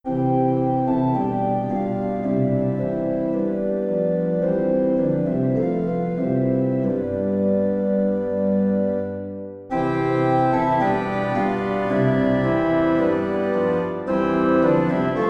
Organ

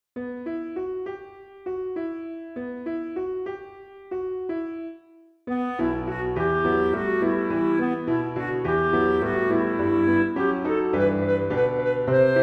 Piano and Clarinet

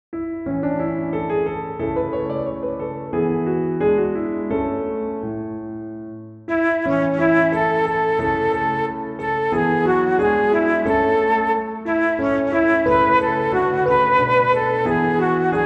Piano Ensemble; 2 verses

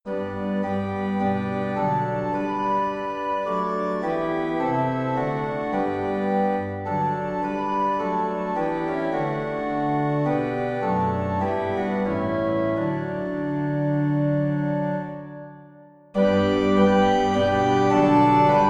Organ

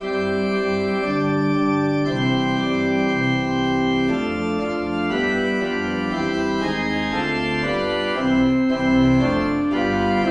Organ, hymns without words; At the name of Jesus; Camberwell

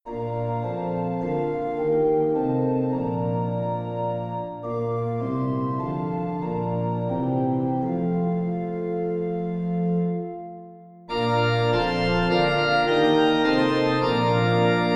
Organ

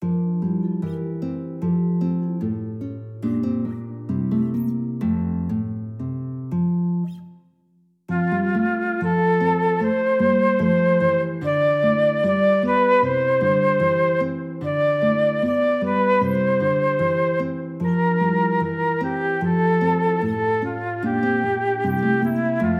Guitar Flute and Violin

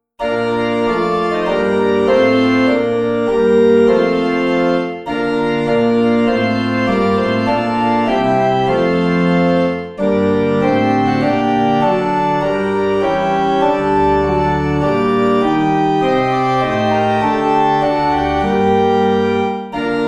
Organ

